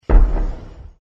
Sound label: canon